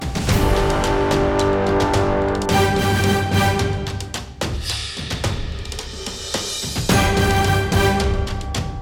Loading loop low

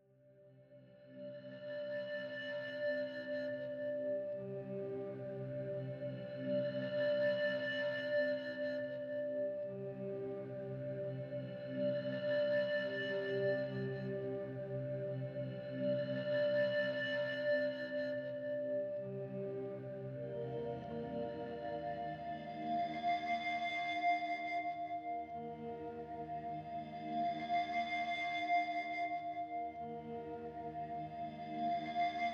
Temple Explore Mood

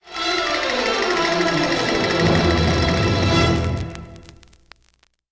Boss starts a fight!; WrestleQuest

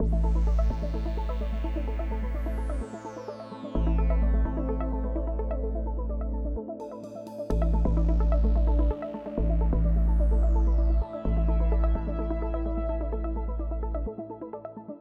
time travel theme wait loop; teardown; ps5 windows xbox series xs

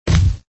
step

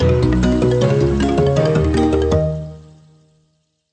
Level Complete; Tumblebugs

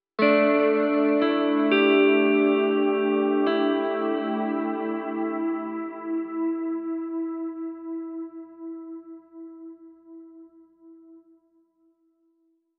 guitar; Void Bastards; Krell

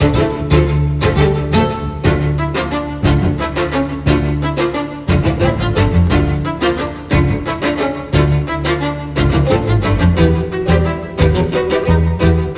BGM battle; Edge of Twilight Athyr Above

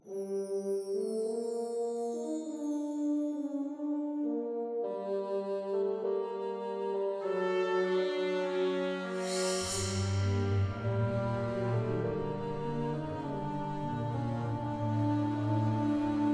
Soft Rnd Melody; tintin reporter cigars of the pharaoh

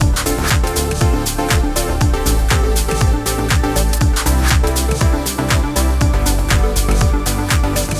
Game Loop; Summer Catchers

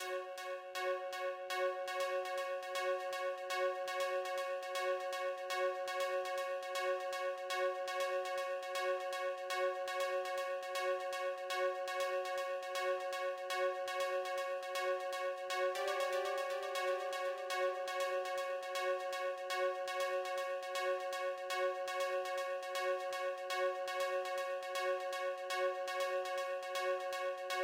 ARPS; rain world

